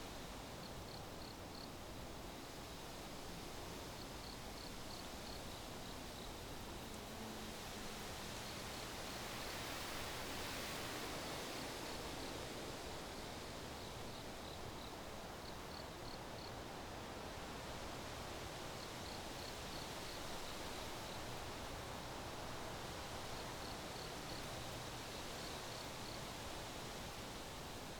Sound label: outdoor grassland night grass sway; anemoi